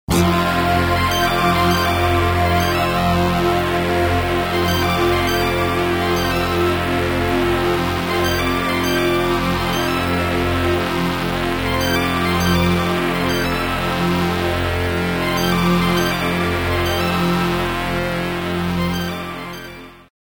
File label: Transformation